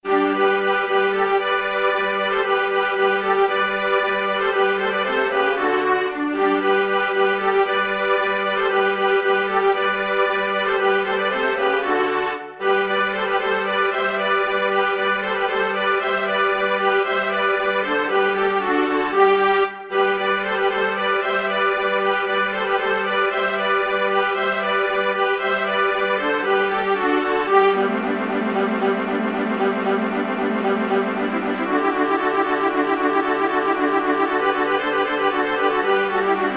viola trio; spring longer

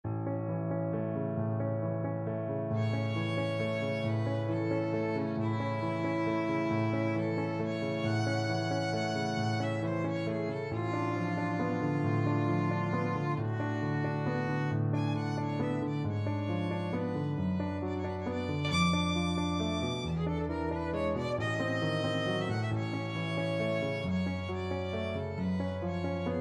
nocturne d flat violin

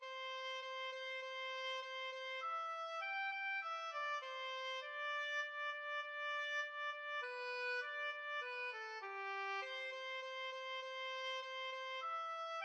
10 little indians, oboe

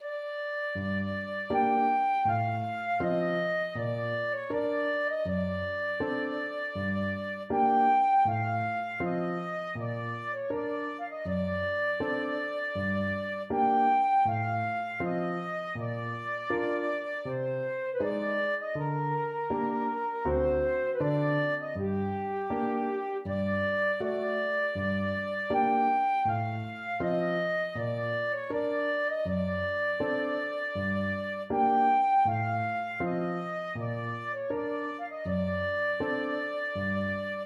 chopin op 55 1 flute

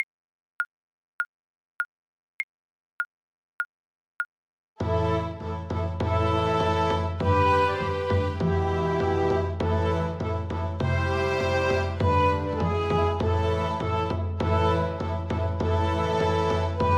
the witches hill violin

